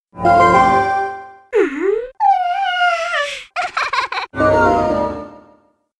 yawn; midnas

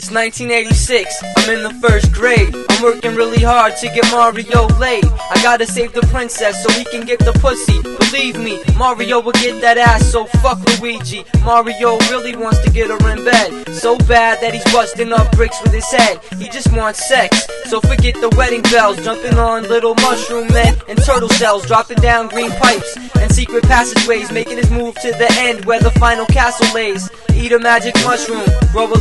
mario rap; hip hop

